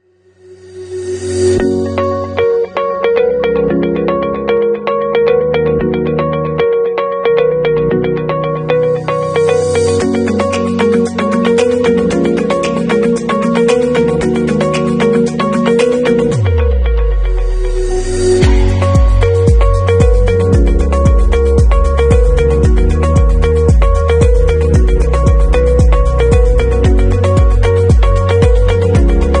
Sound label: Ambient Background Corporate; AdobeStock